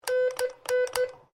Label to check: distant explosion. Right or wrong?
Wrong